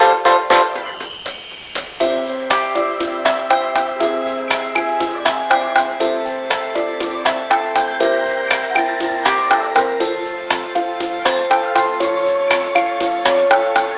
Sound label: music elevator; cs greatassault